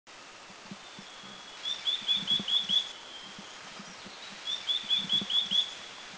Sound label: Black-throated Parrotbill, India, Dirang